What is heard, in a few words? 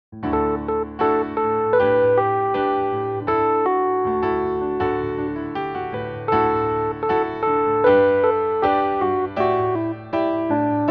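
melody and accompaniment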